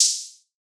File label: Open Hihat; Barred; Cymatics; Trap Starter Pack